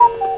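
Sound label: cuckoo; Widget Workshop; Scientist's Laboratory; Maxis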